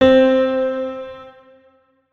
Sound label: notes, keyboard piano; Hideout Furniture